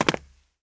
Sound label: jump; GrandFantasia